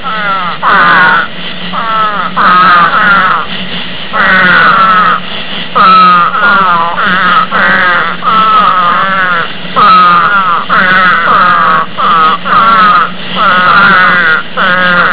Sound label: spadefoot toad